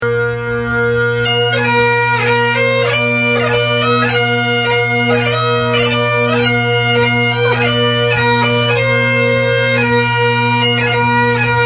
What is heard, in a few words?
radio pipes